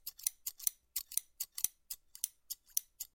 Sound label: scissors